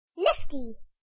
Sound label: exclaim; Chibi Knight